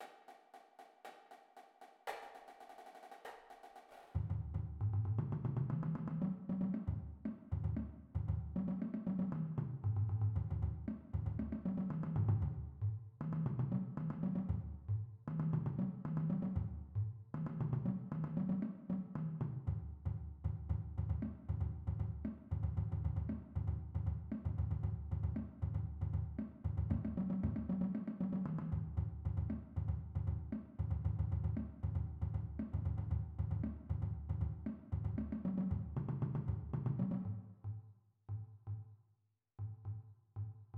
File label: bass